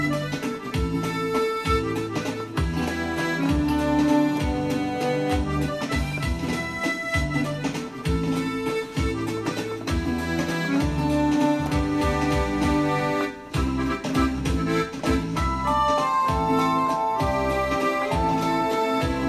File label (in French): Valse chinoise